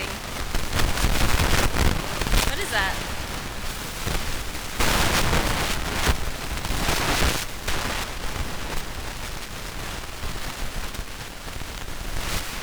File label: Preamp Noise